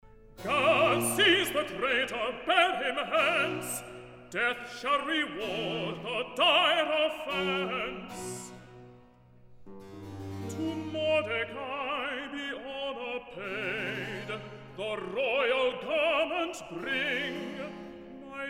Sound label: Recitative; George Frideric Handel; Esther 1718 version; Purim; 'Guards, Seize The Traitor, Bear Him Hence!'